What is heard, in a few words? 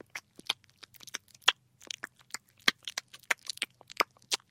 kitty eating; minecraft; technic